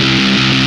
Bass; pitchshifter PSI

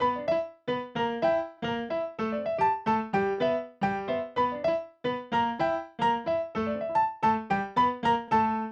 Acoustic Piano

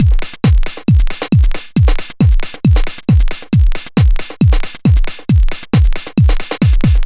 Dance Drumloop; Drums; Dance Beats

